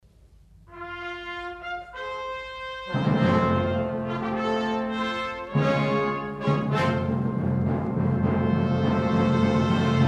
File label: Nordic fanfare Hymn